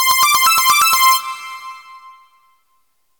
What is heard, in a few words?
ringtone simple; openmoko sound theme standard